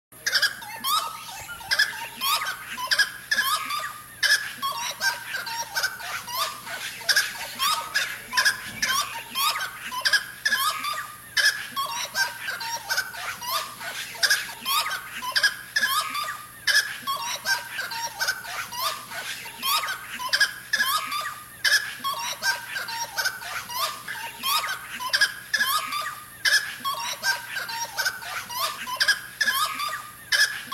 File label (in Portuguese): canto da saracura do mato